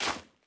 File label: Step Path